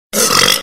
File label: BURP